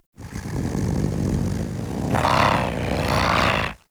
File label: Kavat Vocal Idle; Warframe; Warframes; Khora